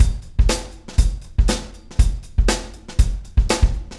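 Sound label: 120 beat; Big Fish Audio - DOM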